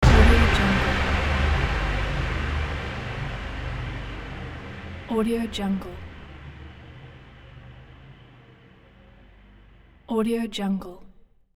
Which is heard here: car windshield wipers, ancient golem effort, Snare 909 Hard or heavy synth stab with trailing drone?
heavy synth stab with trailing drone